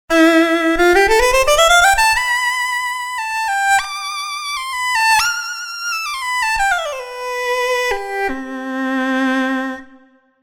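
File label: Bright Violin; TURBO VL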